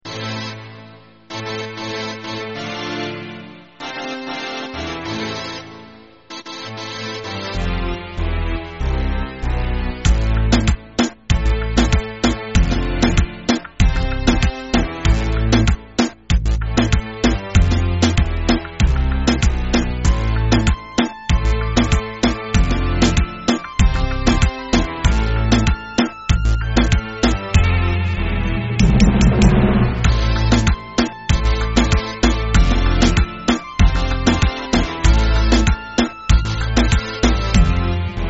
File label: Reggaeton